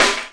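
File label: snare drum; E-Lab; X Static Goldmine 1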